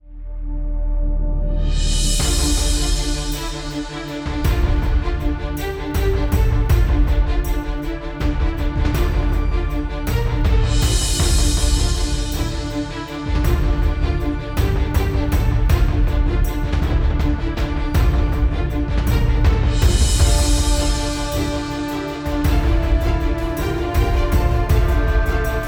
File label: Warning Pursuit Tenso